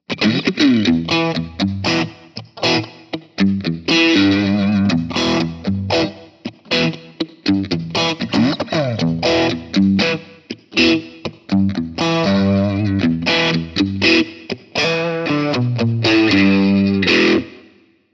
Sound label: Neck Middle, vintage guitars; CIGAR